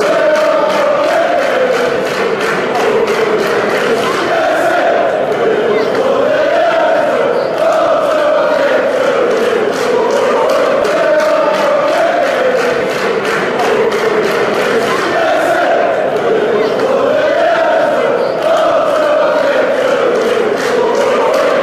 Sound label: over land and sea, chants